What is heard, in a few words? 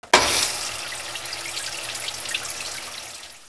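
wash water; casa objetos; washwatr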